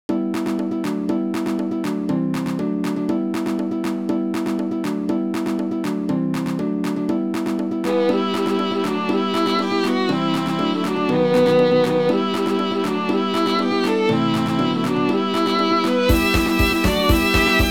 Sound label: celtic gallop